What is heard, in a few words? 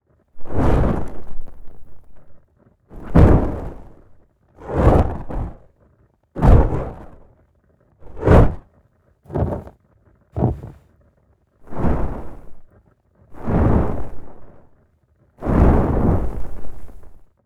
FLAME TORCH WAVING Fire sound effects